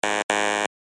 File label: bubu